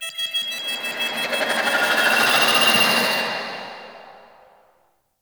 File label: Swarm Warning Pre Pulse Alarm; Entrati